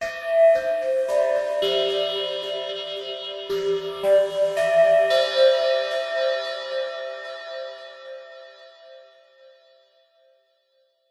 musical big ben chime synthesized hissing delayed bells; zapsplat